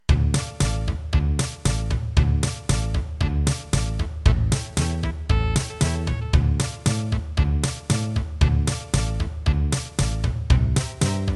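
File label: Paso Doble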